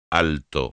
alto

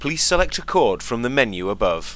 Sound NOCHORD

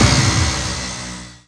BOMB

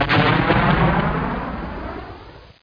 NUKE

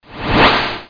Cancel; mtgdemo

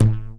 rotate; Pop the Wheezil

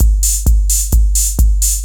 groove

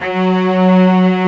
Arco Celli F#2

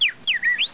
chirp

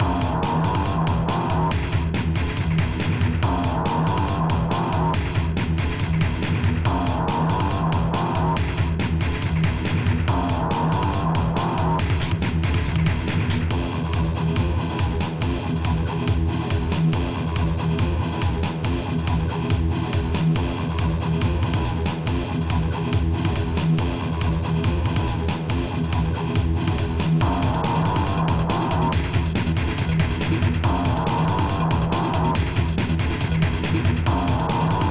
GRUNGE; MUSIC

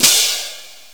Hand Cym; Software Explosion 2 - Virtual Computer Pets; yamaha xg; drums; open l

